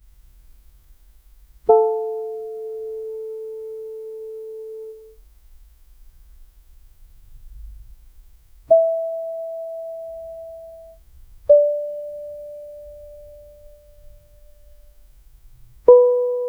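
playing low notes on sousaphone, brass instruments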